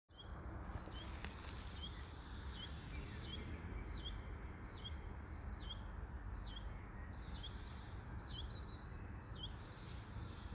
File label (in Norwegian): fugl